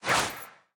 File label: swish small; smite; god artemis